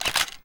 camera shutter; anemoi

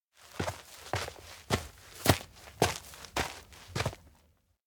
steps outdoor log house front wobble run; chiharu; anemoi

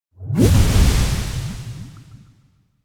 elec bubble cosmos move; anemoi

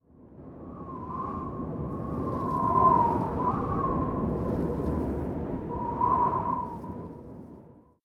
wind hair; anemoi

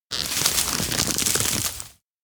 branch creak pullup; fumiya; anemoi

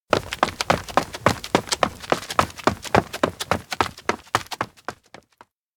steps outdoor road sprint; anemoi

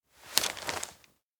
map point finger; anemoi

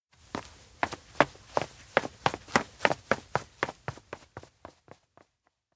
steps flashback runaway reluctant child; anemoi

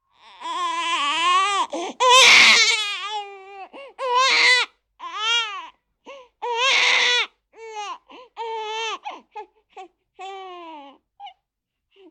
baby voice; anemoi

